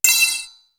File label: EARSPLITER; East West; Global Sounds